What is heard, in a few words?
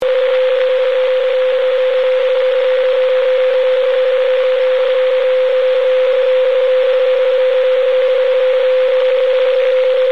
psk31 stream